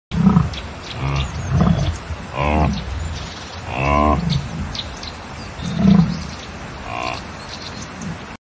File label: yak; غژگاو; یاک